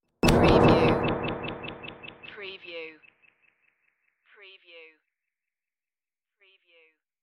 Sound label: interface flutter hit